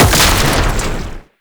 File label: Sniper Lightning Strike; Weapons; Warframe; Sentient; Caliban Prime Sniper